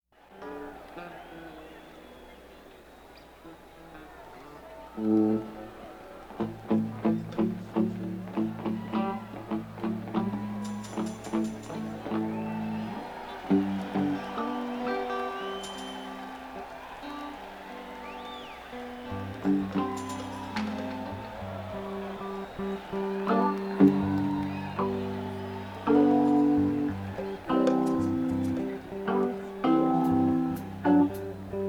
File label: Tuning